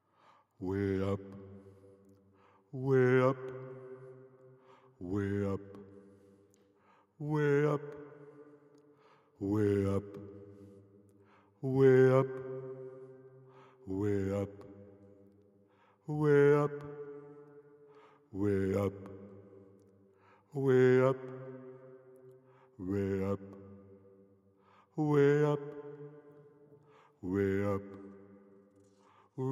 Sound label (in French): basse; le CD pour le Téléthon 2013